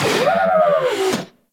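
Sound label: Research Facility Repair Robot Rotate; Warframe; Venus Open World; Objective Rooms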